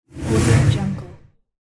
whoosh transition

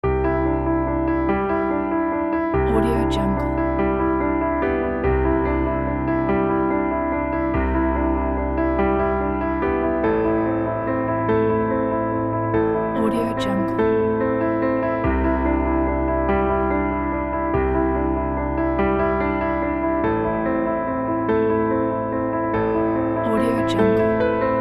hopeful background soundscape

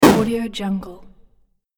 platform hit

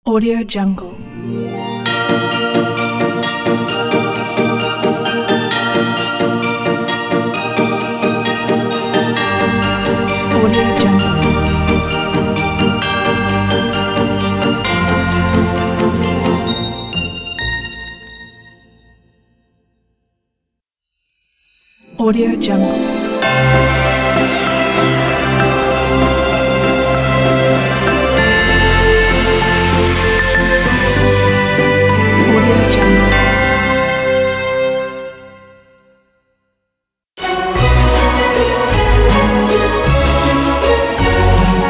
christmas celebration logo pack

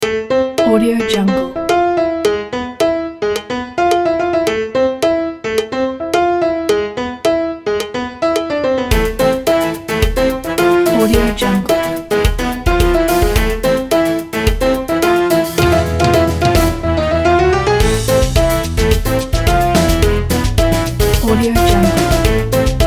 the latin

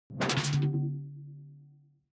ui sci fi alien tech noise

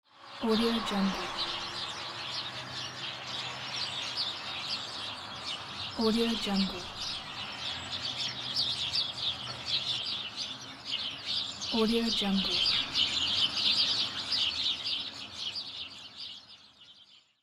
country ambience

